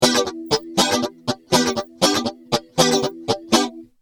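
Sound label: rhythm guitar, ADA flanger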